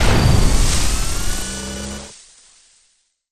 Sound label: Laser Telegraph; Destroyer; Terraria